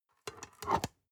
storage lid slide close soft, box; objekte

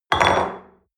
espresso upset table medium, restaurant cafe

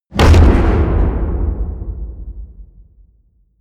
hit wood, hits impacts

